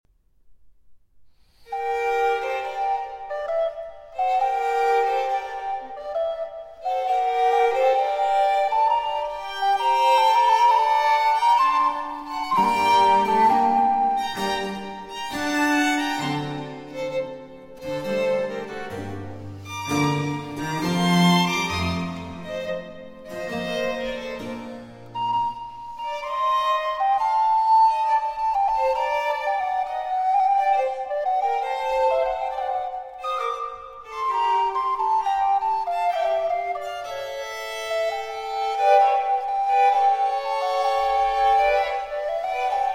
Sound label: Sonata For Recorder, 2 Violins & Continuo in G Minor, Largo